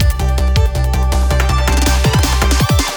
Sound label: Snow Transition; sky rogue